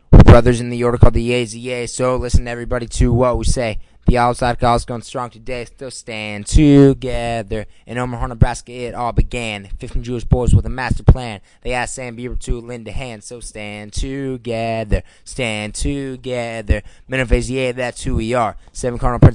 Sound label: rap